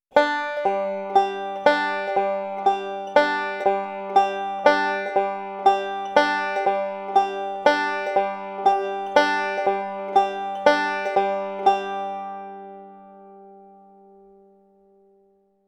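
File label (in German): backward roll synkopen, banjo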